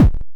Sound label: hit; uberpong